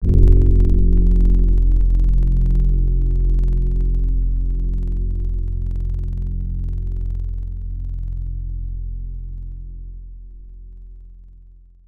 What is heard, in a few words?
Keys Acou Piano; Synthetic's Serum Collection; ESW X Keys